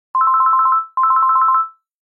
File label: Ring ring; Nokia; 6500 slide; Ringtones